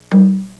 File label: conga drum; Sound Effects for Multimedia - Volume 1